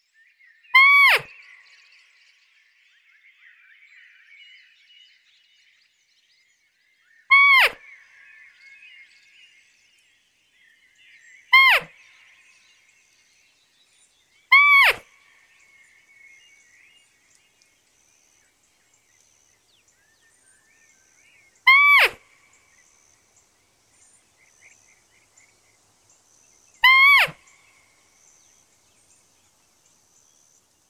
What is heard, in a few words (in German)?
etwas langezogener verhaltener Ree, rottumtaler wildlokkers reefiep